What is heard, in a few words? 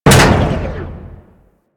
Injection Chamber Tube Mover Stop; Ambience; Warframe; Grineer Ocean; Ocean Sabotage Injection